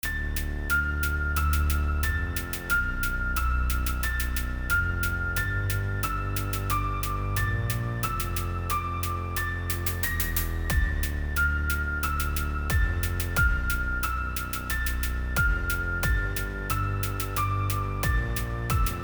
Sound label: dark slow; CC0 Scraps